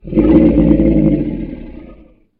Gold Dragon big roar; SyrinScape; Vault of Dragons

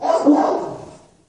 Big vicious hounds

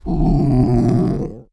scrm; The Elder Scrolls III - Morrowind

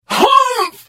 Hit; The Elder Scrolls III - Morrowind

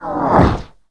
roar; The Elder Scrolls III - Morrowind

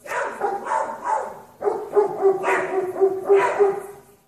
Riding Dogs

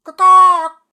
Ka Kah bird; SyrinScape; Syrinscape unplugged; Jungleplanet dinolaser battle

